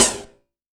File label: D#, synth line; 90 s Sample CDs; NiceBeats; SynthLine VOL-3